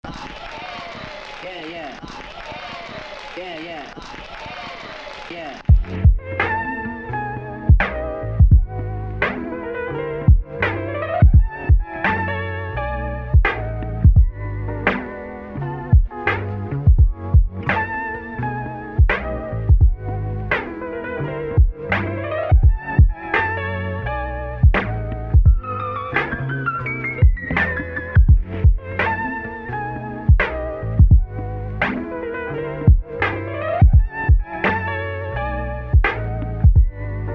The Piano Is Wobbly

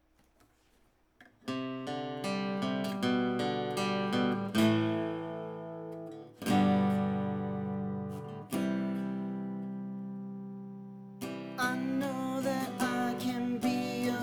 (Acoustic)

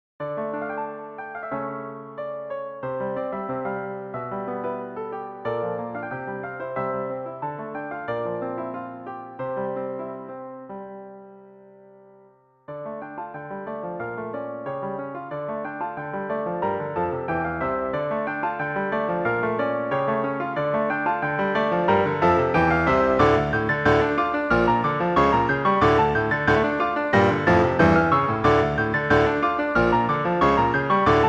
Piano Solo

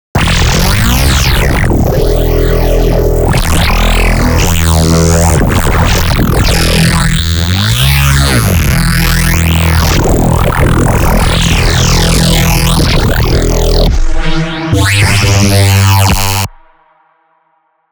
Growl Test

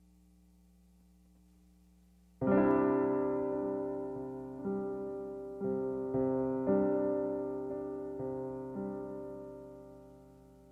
Piano intro

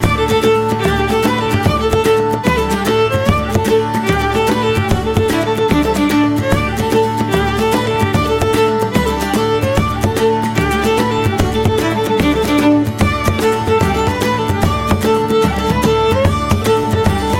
Irish Jig

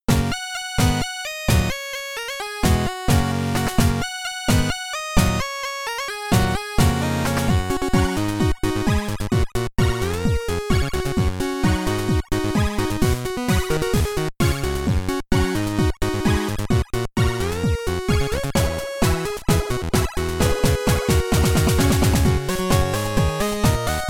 (Chiptune cover)

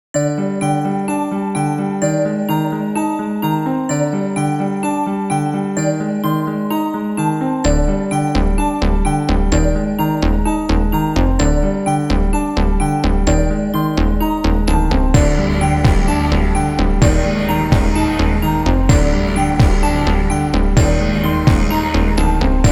Dark Rap Beat

